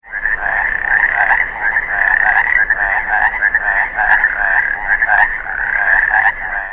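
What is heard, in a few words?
pregilla trill, frogs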